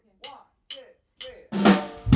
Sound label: funk soul dance